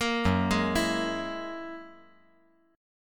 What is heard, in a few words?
Gb 9th Gb down 5G,4C,5E,5A